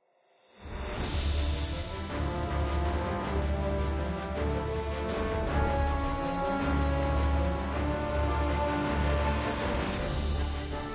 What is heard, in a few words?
enemy move; devils demons android game music